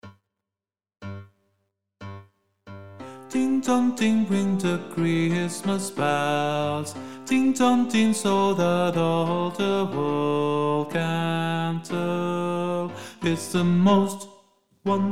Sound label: SOPRANO, SHOW CHOIR; ADIOSES SHOW CHOIR; Navidad 24